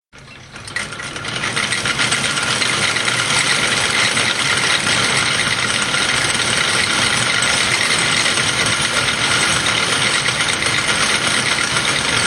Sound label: wind